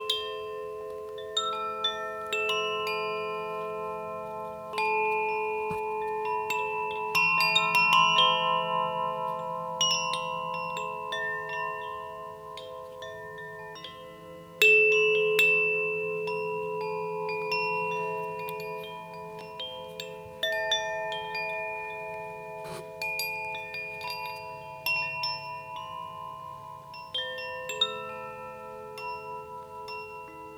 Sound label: Koshi Aria, Klangspiel; Luft